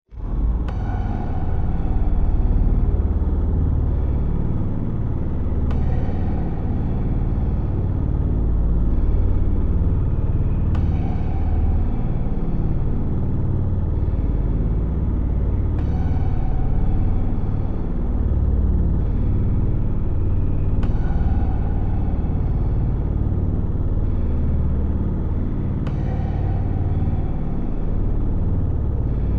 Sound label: Submarine Interior Ambience Warfare; Atmosphere Motor Noise Sonar Pings; Boat; Vehicles; Boats